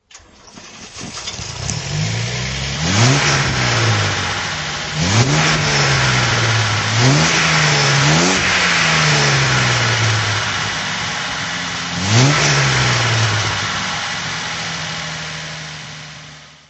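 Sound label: facel throttle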